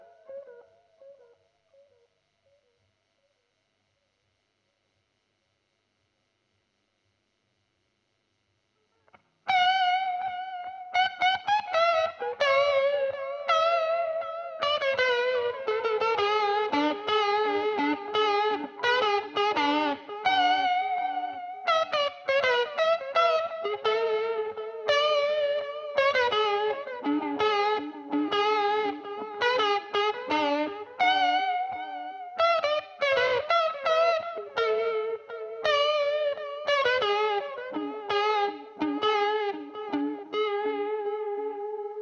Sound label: GTR Royer